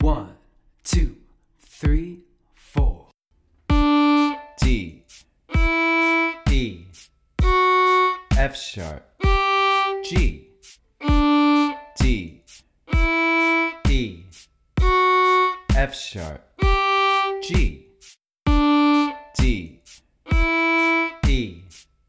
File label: note name game, fiddle; d string